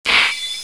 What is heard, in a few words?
PCI based Power Mac Death; PowerMac 9500; Los sonidos del Mac al arrancar